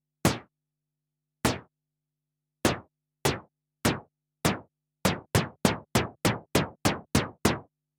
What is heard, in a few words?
Snare, synthe